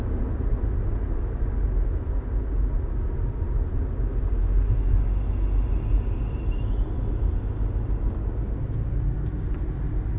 recorded noise expansion valve issue whistle, honda accord; crosstour 2003-2012